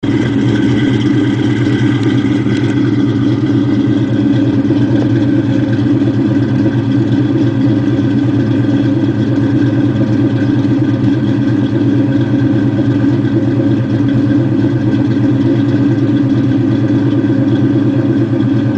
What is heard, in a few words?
exhaust